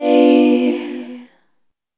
Chord B Major; Sonic Foundry (Sony Creative Software); Pocket Diva World Class Vocal Samples

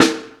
High Snare, Snare One-Shots; KJ Sawka; Drum n Bass & Beyond; Drum Tool Box

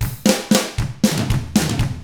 ROCK

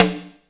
SNARE, WET SNARE; AKAI S6000 CD-ROM - Volume 3

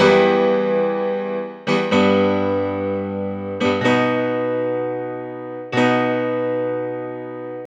Disco Fever

